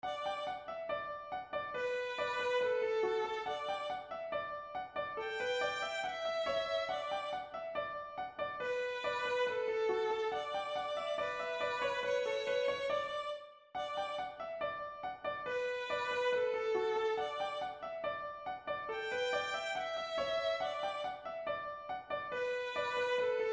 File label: melody harmony; Iggie and Squiggie